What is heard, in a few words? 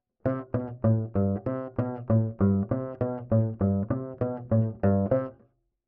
Bass Line